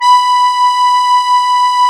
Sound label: HOHNER, akkordeon